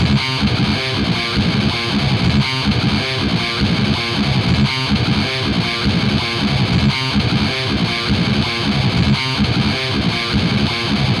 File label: TS-9 Mesa Triple Recto